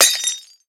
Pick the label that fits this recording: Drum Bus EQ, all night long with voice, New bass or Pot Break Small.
Pot Break Small